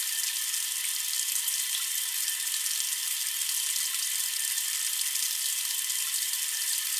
sprinkler loop; Barotrauma; barotrauma ek

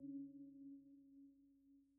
sonar Tail Air Medium; Barotrauma; Real Sonar

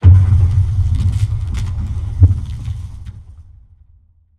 Ice Creak; Damage; Barotrauma; Room FX

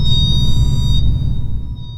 sonar Tail Water Close Beacon; Barotrauma; Real Sonar

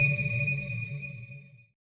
sonar Tail Water Medium Directional; Barotrauma; Real Sonar

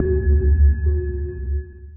sonar Tail Suit Far Shuttle Directional; Barotrauma; Real Sonar

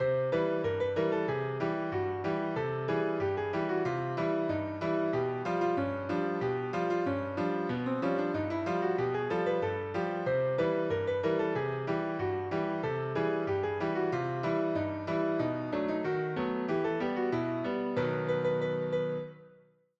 entry of the gladiators; Thalassophobia Restored

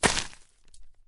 limb blunt; Barotrauma; Subsurface; Damage

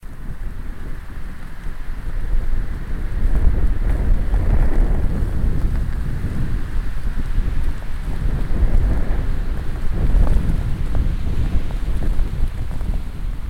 moving loop; PYLON; Barotraumatic

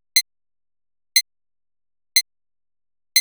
Countdown; Thalassophobia Restored

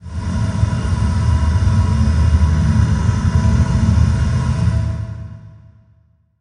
Pylon idle; Barotraumatic

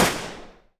dartgun; Weapons; EK modern; Barotrauma

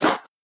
drop Item; Barotrauma

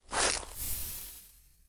syringe, Medical; Barotrauma; Subsurface

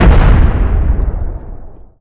cannon shell; Weapons; ekgunnery; EK modern; Barotrauma

